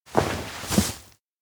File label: sit next; koyomi; anemoi パッケージ版